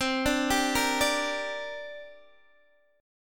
C 7th Suspended 2nd, Guitar, Open D

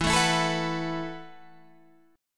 E Suspended 2nd Suspended 4th; strummed; JGuitar

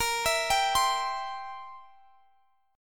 C Major, chord; arpeggiated; x,x,20,21,20,20

